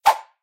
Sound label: Popup Out; Candy Crush Soda; Gofishing